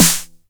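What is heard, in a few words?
909 SD Tape, snare drum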